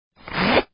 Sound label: Zipper